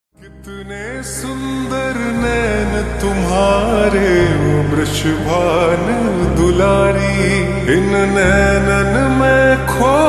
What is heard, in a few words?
Slowed Reverb Ringtone